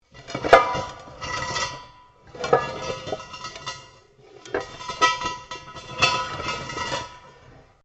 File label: METAL ON METAL LARGE MOVE; Metal (Sound Effects:Materials)